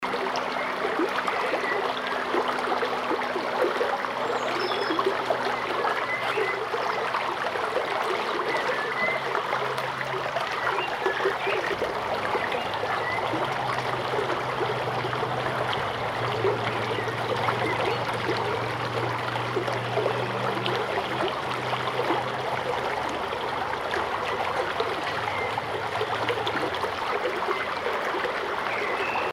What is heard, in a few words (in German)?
Bachrauschen; Meerbach; Filsquelle